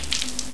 splat; player

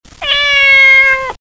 cat; svencoop; FZSaySound

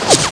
whizz; weapons

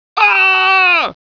pain; svencoop; bshift; rosenberg

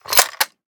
stock, l2a3; svencoop; ins2

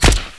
airgun; weapons; svencoop; tfc

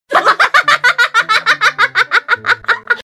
Marines laugh; svencoop; FZSaySound

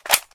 mag hit, svt40; svencoop; ins2

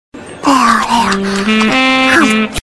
eat; svencoop; FZSaySound

